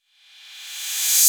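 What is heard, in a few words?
808 cymbal reversed; Wizoo; Platinum 24 Electronic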